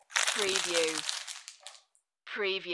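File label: Plastic Wrapper Crinkle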